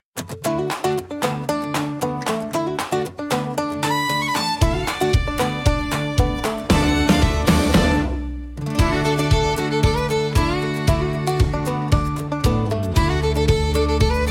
Sun On The Front Porch, country acoustic

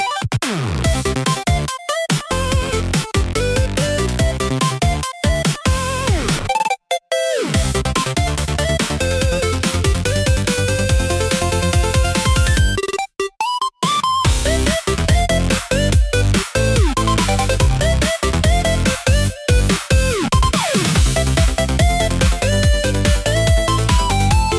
Coin Rush Clear, retro game chip tune; pico pico sound

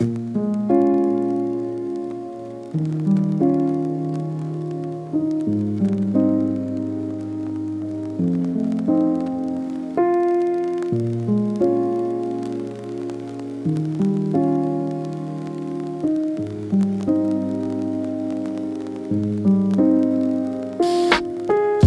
emoi lofi piano for recall and nostalgia

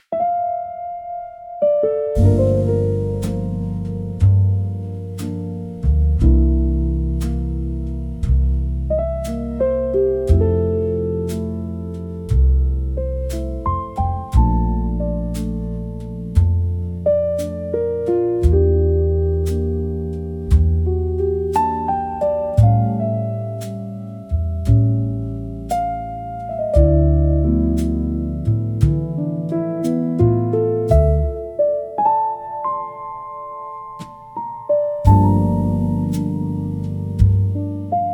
smokey night jazz for night bar

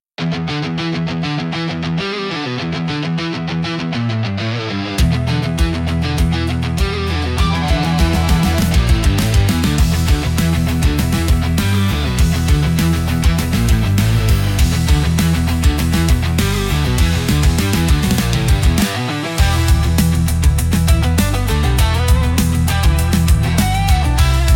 Red String Riot, intense japanese rock

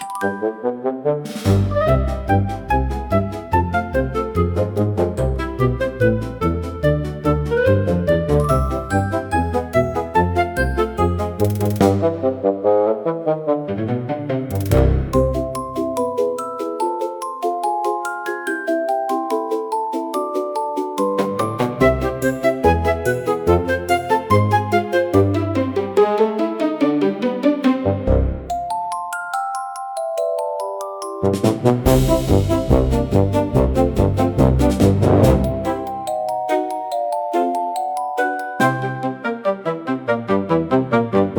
fantasy music cute bright

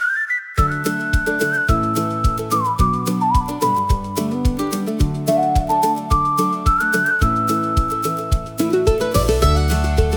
Sun On The Highway, country acoustic

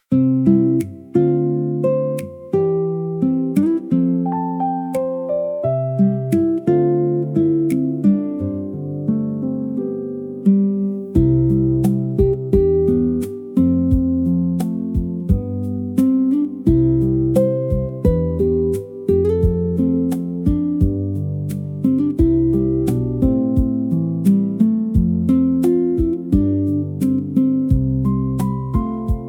fantasy music nature forest

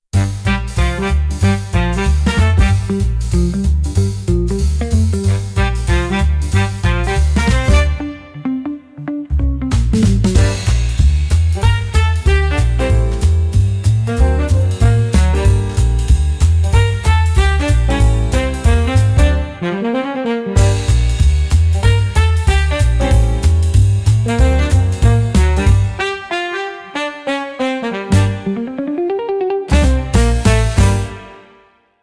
Brass Parade, big band swing

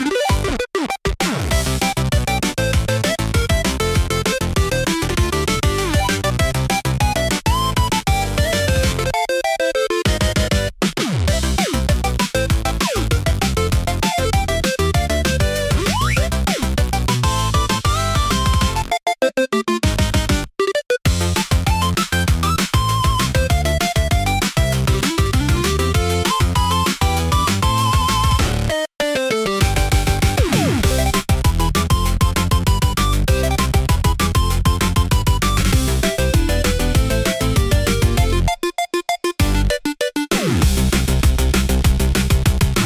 retro game chip tune; pico pico sound

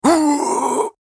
"Ugh", samurai voice; samurai voice selection 2

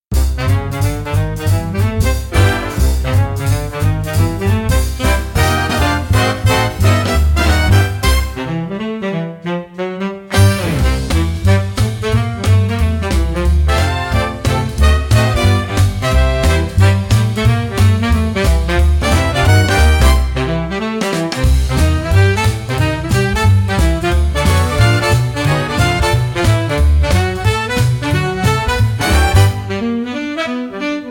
Showtime Brass Parade, big band swing

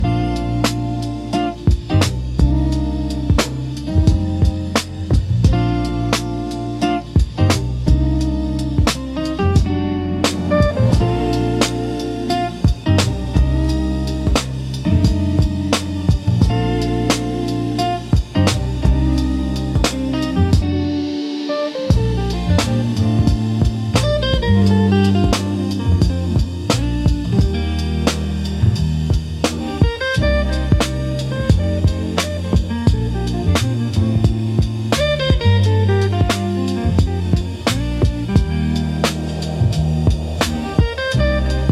lofi jazz hop

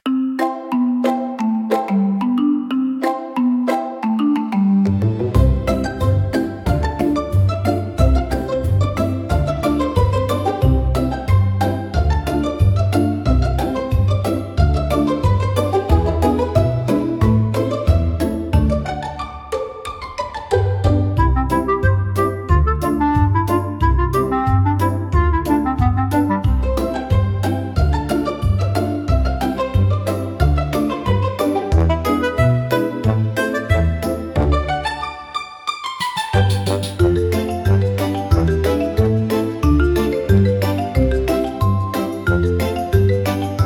Sneaky Shuffle, comical marimba

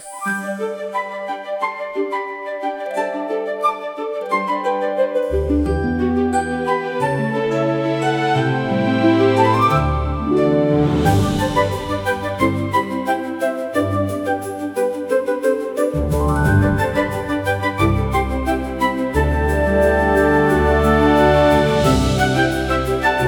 fantasy music cute bright

